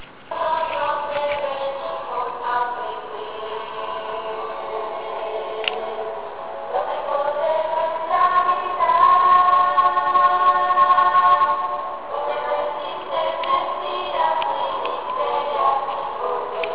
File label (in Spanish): coro juvenil; valle 2007